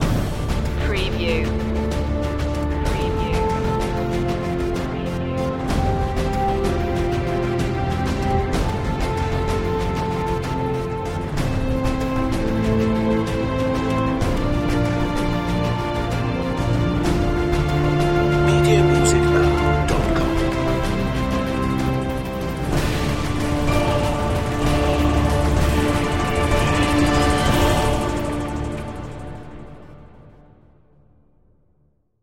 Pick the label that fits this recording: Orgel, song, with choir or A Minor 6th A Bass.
with choir